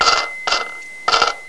CASH

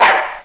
Dog; Maxis; Widget Workshop™

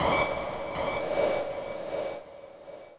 FX AMBNT 160, Ambient

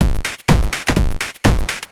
DRUMLOOP HOUSE 125; eJay

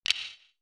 lock

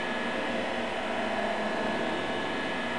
Sound scroll; X-Files preview

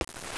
Quick Lift; Seahaven Towers™

